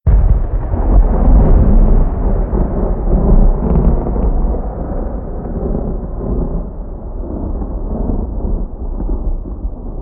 Thunder; moul

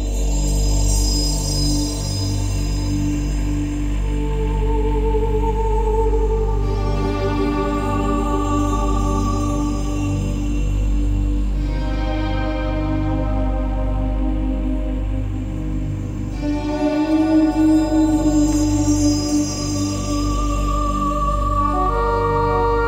City Music Loop; Baron City

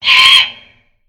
Creature Button

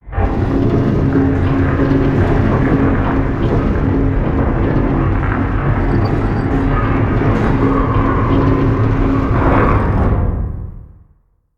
Valve Door Close

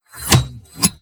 Imager Button Click; moul

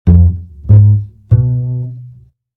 Bass

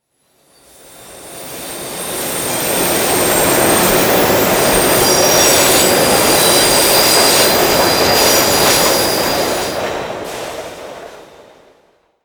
Harvester Brakes Squeal; moul

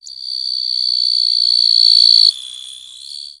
Canyon Rnd Critter; moul

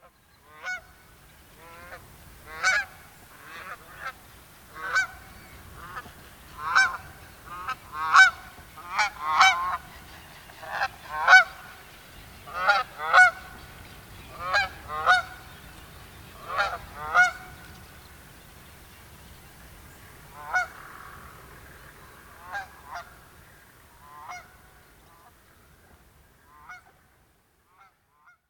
Geese Fly By

